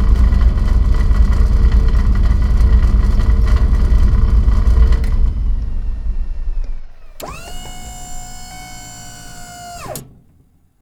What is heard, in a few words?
drill end; ReStocked